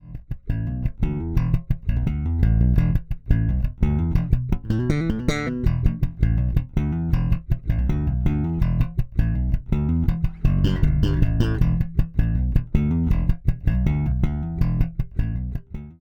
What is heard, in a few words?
MTD DB599 Darkglass; Gnome